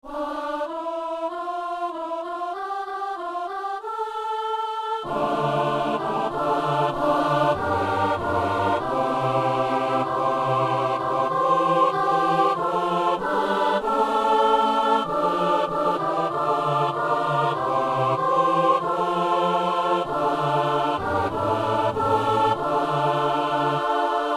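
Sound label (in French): messe anamnèse; messe de saint jean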